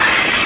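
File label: squeal, cars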